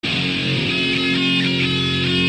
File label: guitar pace